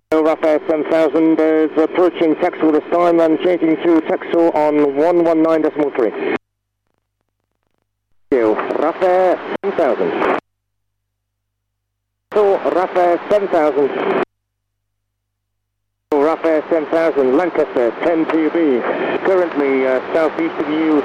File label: lancaster performing a fly by overhead; texel